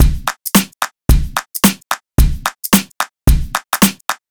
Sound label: Beat 110 bpm beat; ElectroSwing; WSL-ElectroSwing Vol 4 Additionals